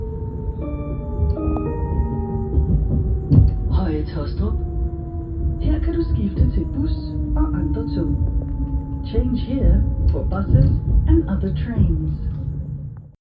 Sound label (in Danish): hojetaastrup dsb jingle